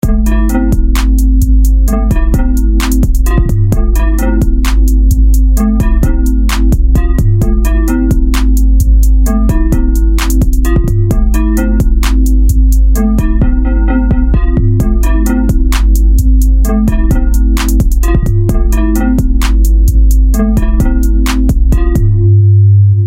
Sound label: Island Trap